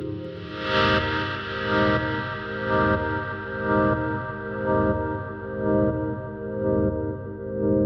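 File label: 122 BPM Sequence D major C minor Tonic G; innovative sequences 1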